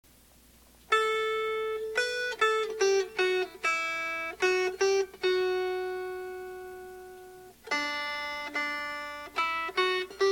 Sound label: Melody; If On A Quiet Sea